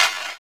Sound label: HALL CLAPS; drum; CLAPS+SNIPS